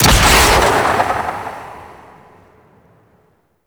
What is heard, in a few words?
Devour Burst Impact; Warframe; Mummy